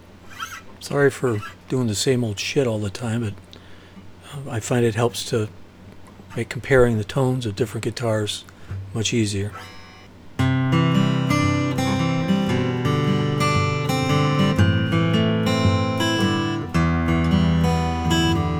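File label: collings sj indian 1 75 nut, guitars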